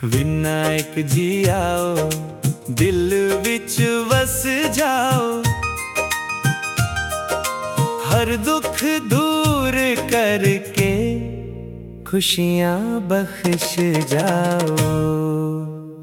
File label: punjabi, ringtone; ganesh